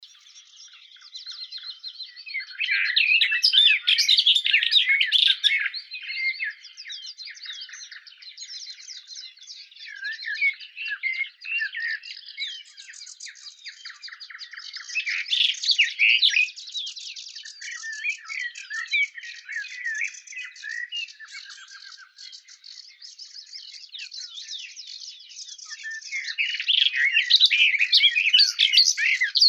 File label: slavka yastrebinaya sylvia nisoria; zvuki prirodi